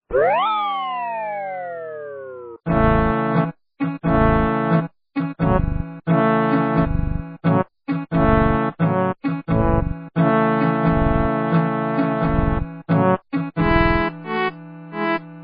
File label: course cleared